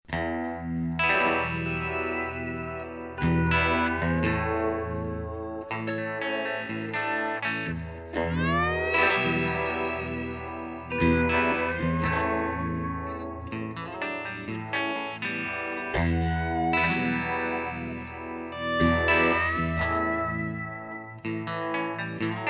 GTR & SLIDE LOOP

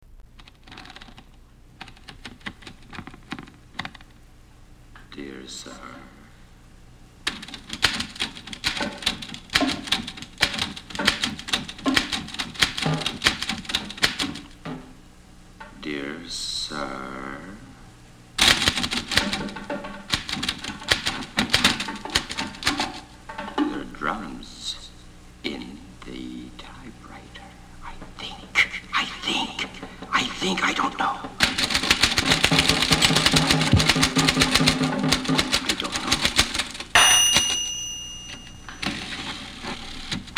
Drums In My Typewriter

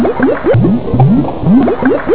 bubbling

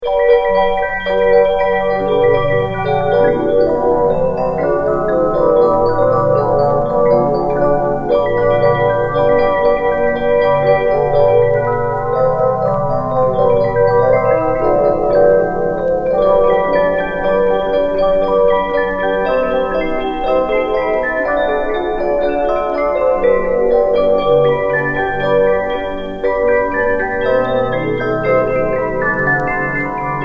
Jingle Bells; Special Ed Xmas

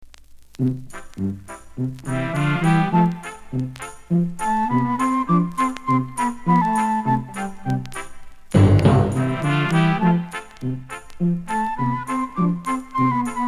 Instrumental

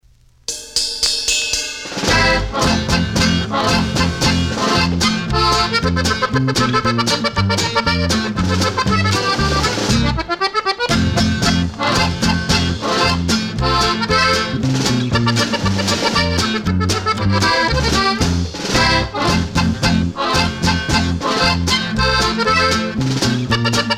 Jingle Bells Polka

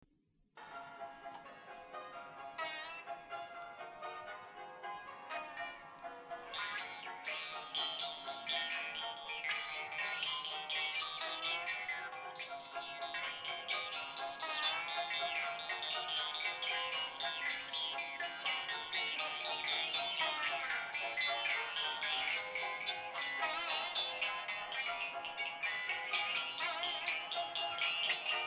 Jews Harp Band

